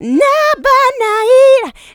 voc, female vox, Vocal House; PS-28 Vocal House Samples

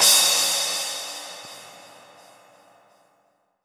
Crash, Cymbals; Vengeance Samples; VEH3 Cymbals

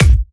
Hard Kicks; Vengeance Samples

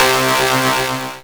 Synths C; Vengeance Samples

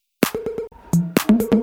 Electro Loop 128 BPM; Vengeance Samples

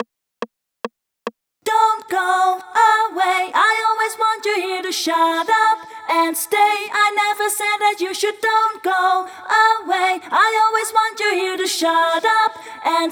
Chorus (Dubbed) FX, 142 BPM; Vengeance Samples; Hello You

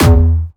F2, Jumpstyle Kick; vengeance essential club sounds-3; VEC3 Bassdrums

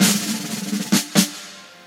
Fill Ins 128BPM; Vengeance Samples; VEH2 Loops

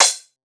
Cymbals crash; Vengeance Samples

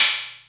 Special Hihats; Vengeance Samples; VEC1 Cymbals

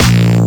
Synth Bass; Vengeance Samples; VEE Synth Shots

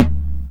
percussion; Vengeance Samples

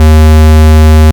Root D#, Bass

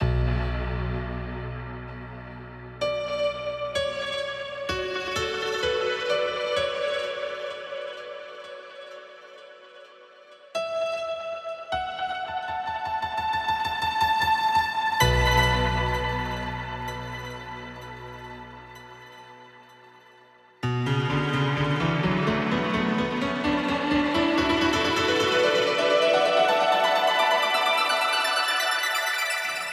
Intro 128BPM Piano; Vengeance Samples

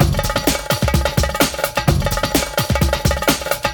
House Beat, 128 BPM

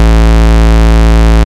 Bass Cuts; Vengeance Samples; VES1 Instruments

